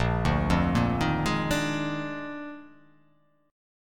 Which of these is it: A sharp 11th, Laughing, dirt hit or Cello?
A sharp 11th